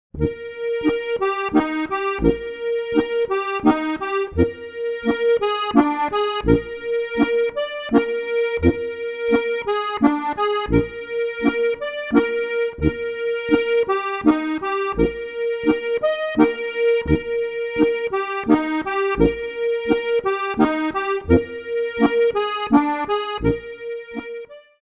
landla

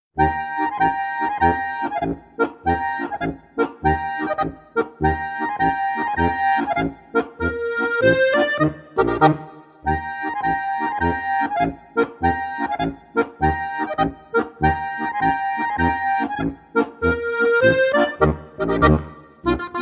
polka

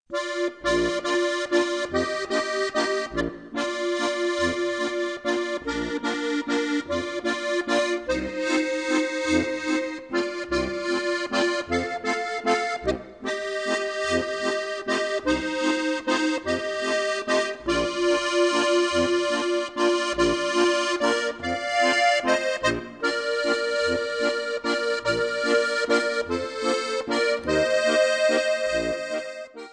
walzer

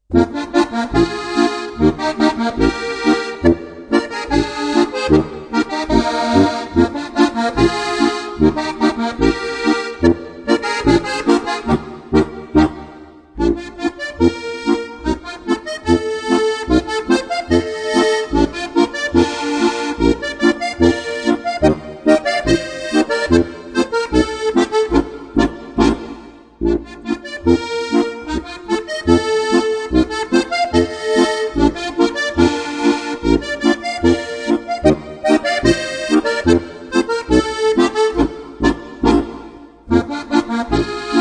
boarischer

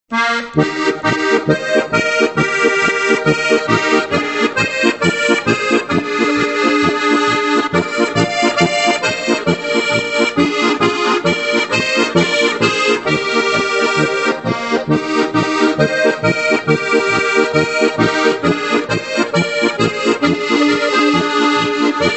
Rauschkugel Polka

